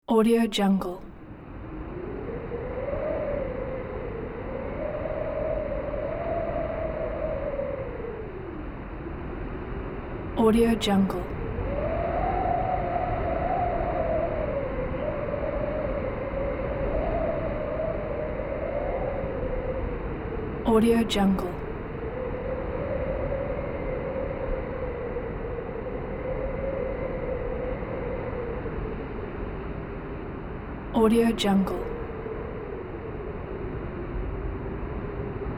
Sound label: winter wind